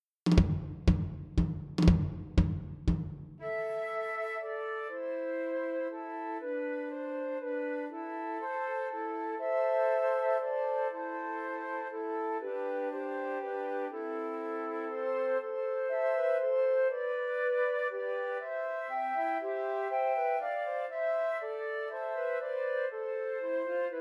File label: Trios for Three Descant Recorders